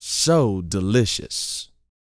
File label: GOOFY, Vocal Planet R&B Dance SCI-FI; 5 SCI-FI CHZ